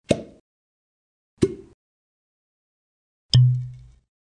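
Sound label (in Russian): звук бутылки скрипит при открытии бутылки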